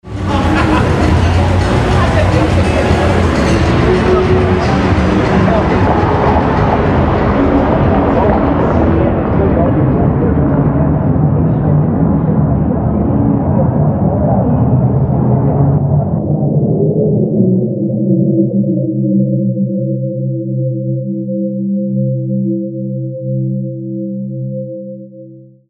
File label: noise to pitches